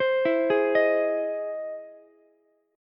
E Augmented Major 7th E down Ukulele; arpeggiated; E+M7; JGuitar

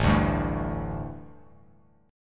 A-7th Suspended 4th-A-5,5,5,2-1-down; A7sus4 strummed

